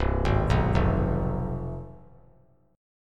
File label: C Major 7th Suspended 4th Sharp 5th, down; CM7sus4#5 arpeggiated; JGuitar